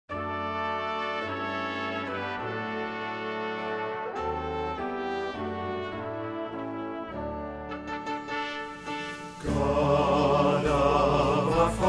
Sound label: Tenor, God of Our Fathers, Choir Rehearsal Parts